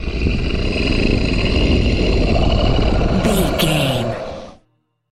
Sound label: troll monster growl slow